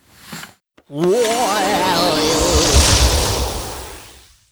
feature

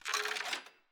scatter Counter Up; netent

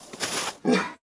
medium Win Anim; netent

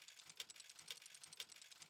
reel spin loop; netent

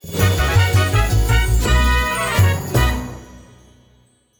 win Large; netent

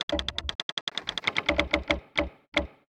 wheel Animation Stop

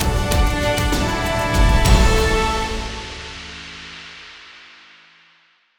big Win End; netent; Oceans Gold

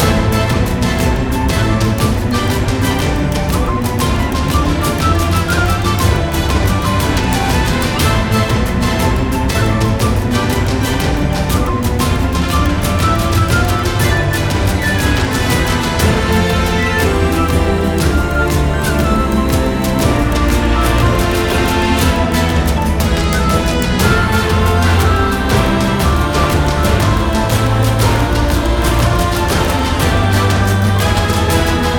main game loop level 1; netent

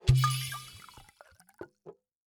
spin button click; netent

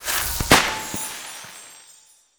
small win; netent

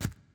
reel Bounce; netent

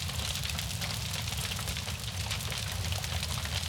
Free Spins Burning BG; fruitblaze; netent

